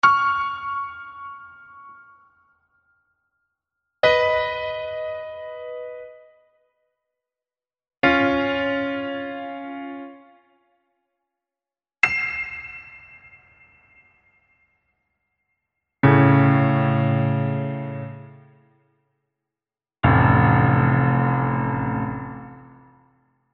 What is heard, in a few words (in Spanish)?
Ejercicios de Intervalos simultaneos de tercera mayor